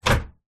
household microwave oven door open metal; zapsplat

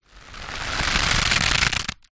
foley rope stress tighten creak; zapsplat

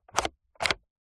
technology vr headset focus slider movement

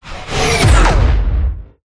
whoosh; Audio Mangler

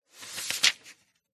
paper book novel single page turn; silverplatter audio

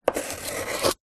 office pencil draw line on paper; zapsplat

